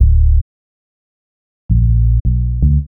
Bass, Futurist Drum & Bass

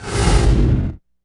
IMP FLAME, Cinematic Impact

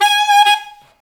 SAX A. STAB, SAX Alto Section; Roland LCDP07 Super Sax; Alto Sect FX

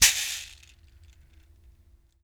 Berimbau Shaker, World Percussion; Sony CS

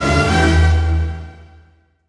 HIT, full orchestra

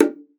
Bongos; Best Service ProSamples vol.42 - Session Instruments

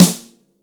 kit, Yamaha Motif-ES8

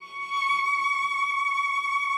CELLO VIB C#6; Xsample VOL-7

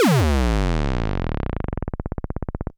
Kaossilator Fat Blob

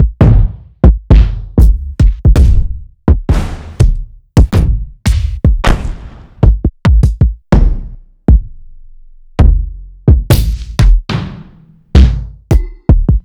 808 trap Kick Drums

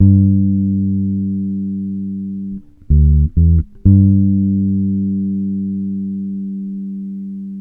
FEAR G, Bass Grooves; USB Soundscan vol.30; AKAI

